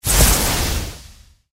lightning strike; Candy Storm

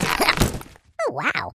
penguin fishing; Fishing For Gold

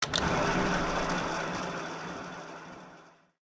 spin; Hot Fruits 100; slot game

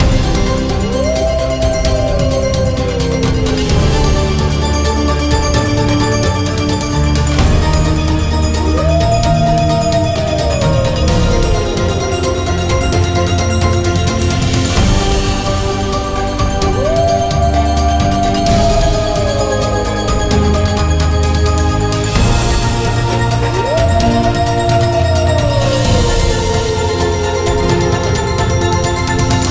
free spin melody; WolfMoon

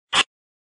card give; games; poker; American Poker The Legends II

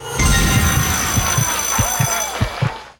big win; Book Of Pharao

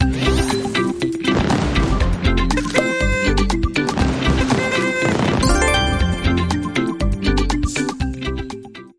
superwin amb sfx; Hot Party Deluxe; Wazdan

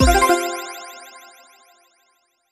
low symbol win; Hot Spin Deluxe

